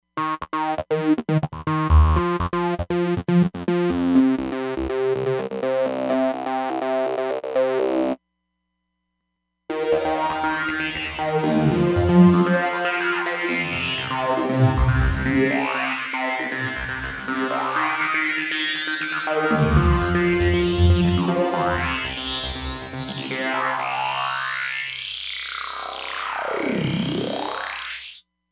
Flanged Pan Gate, Roland DEP-5; Factory Patches

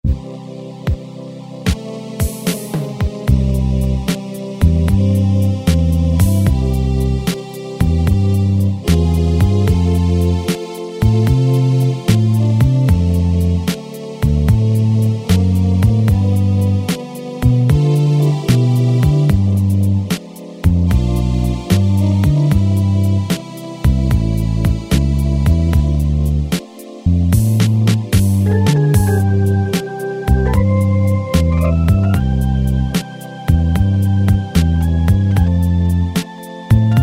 ballad, Automatic Rhythms

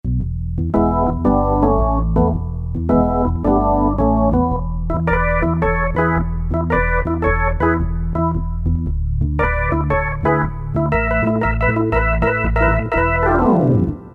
Click Organ, Roland PN-D50-03

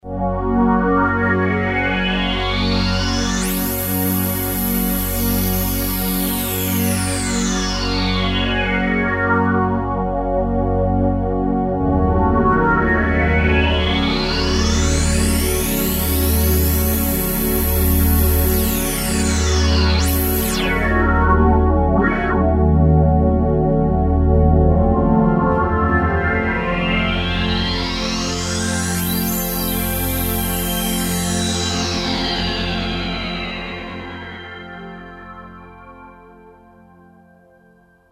JX Sweepee, Roland JD-990; Factory Patches

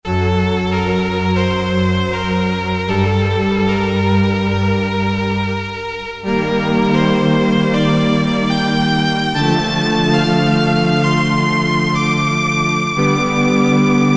SLOSTRNG, Korg DSS-1 Strings

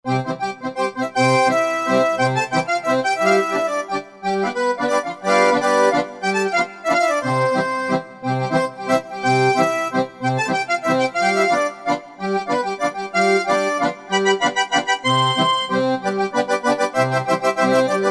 Accordion, Korg Wavestation A-D

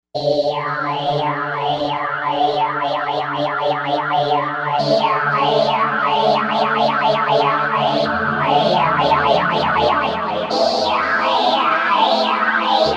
Backing Vocal, Novation UltraNova; Factory Patches

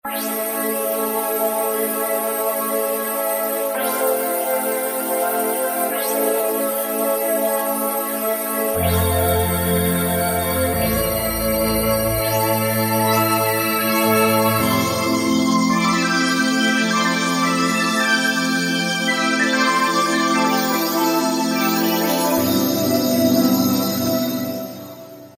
Pd AN Layer, Yamaha EX5R; Factory presets

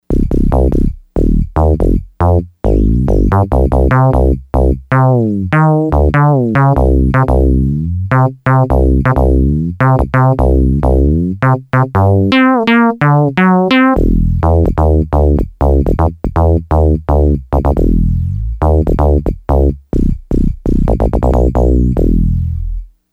Mono Bass, Yamaha DX100; Factory Patches

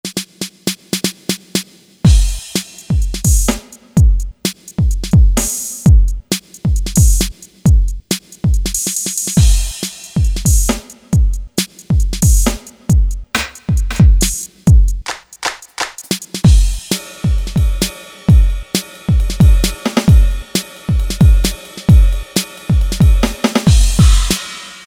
Drum Kits HYBRID; Generalmusic Equinox

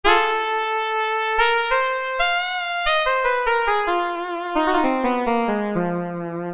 Xarmonica, Roland PN-D50-03

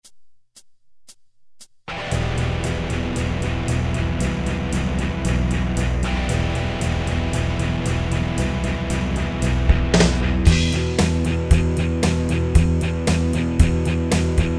Grunge Rock, Yamaha QY70; Preset Styles

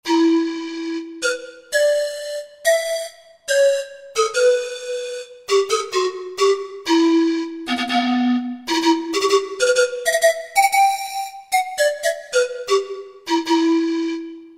Pan Pipes, Roland JV-2080

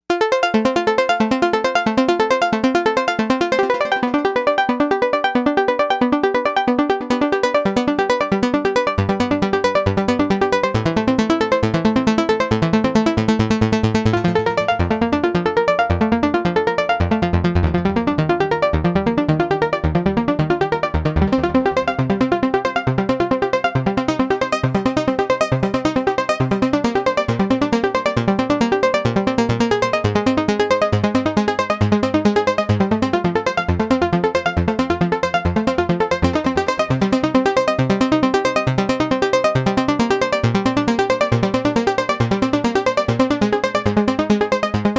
Stab Arp, Novation UltraNova; Factory Patches; PinereStab Arp

